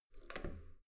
metal door heavy handle up soft

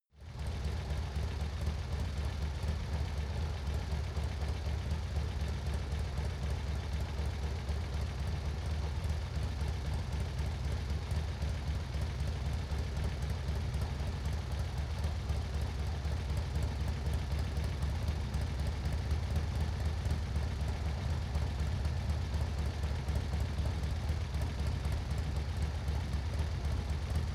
motorcycle harley idle

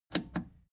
plastic button scratch smooth click enable slow

